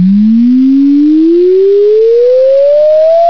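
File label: oscillate; EFFECTS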